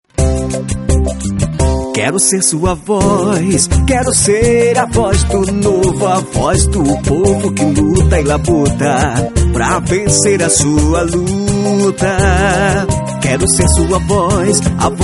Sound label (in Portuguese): Jingle politico